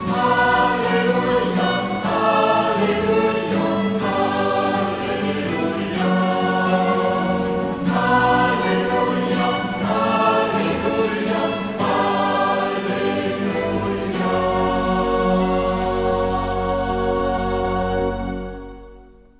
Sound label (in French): Alleluia Psaume 117